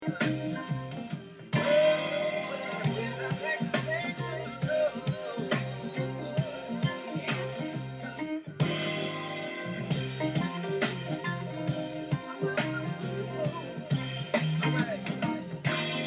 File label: silent night ten